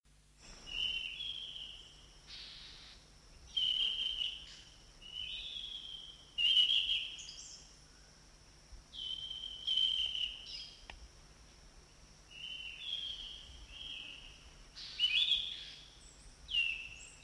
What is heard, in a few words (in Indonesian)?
anis cendana hutan